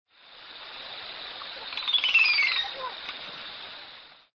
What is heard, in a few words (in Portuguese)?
Psarocolius decumanus Japu